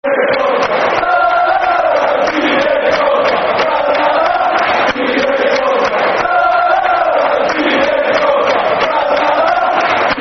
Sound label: Football Chants (Soccer Song); Didier Drogba; Chelsea Cfc